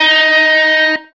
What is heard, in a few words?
electric guitar